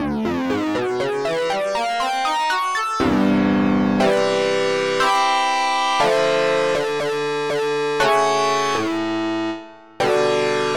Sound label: Sync Wah